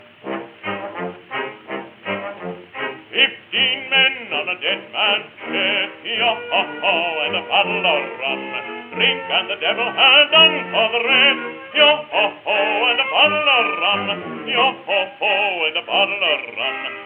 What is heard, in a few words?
Pirate Song ringtone; android phones